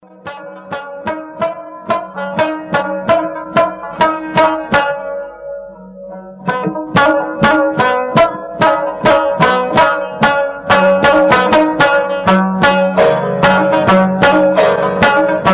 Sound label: Rabab